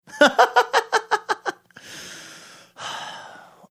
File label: laughing sighing